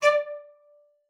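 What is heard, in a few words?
cello spiccato D5, cello; Xsample VOL-5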